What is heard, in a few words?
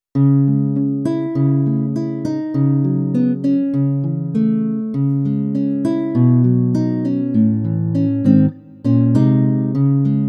Kronos Half Mute